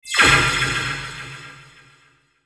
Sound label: Sweeper Warp Fast Delay, Sweeper Shimmer Chopper Flutter; Production Element Imaging Element Accent Transition; Sweeper Sound Effects